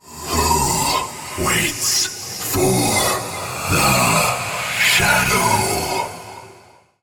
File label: Ghost Ship; Cinematic; Warframe; Wraith Quest; Mission One